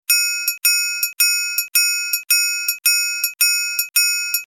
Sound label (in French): triangle